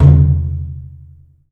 DRUM; The Piano; InVision Interactive

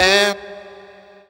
VX, Hip-Hop & R&B; USB Soundscan vol.47; AKAI

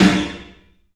PA DEEP R, CLUB SN VRB; 3D Sound; 3D Drums

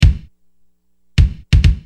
Tribal, Drums Perc; Industrial

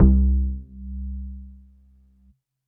Synth Prophet Bass C; Electronisounds

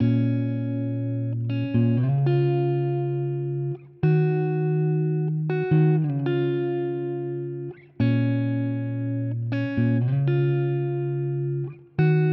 Guitar Loop 120BPM C#m, chords & melodies; 99Sounds; Monosounds; Music Loops; Instrument Loops; Guitars

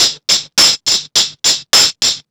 TAMB; Zero-G; Chemical Beats

